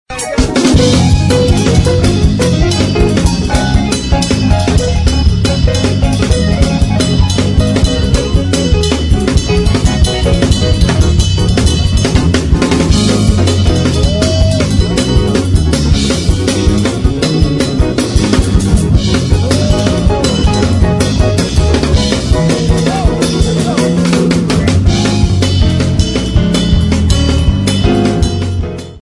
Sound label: gospel ringtone